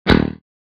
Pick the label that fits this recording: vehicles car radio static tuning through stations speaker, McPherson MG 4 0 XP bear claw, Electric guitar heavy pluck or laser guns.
Electric guitar heavy pluck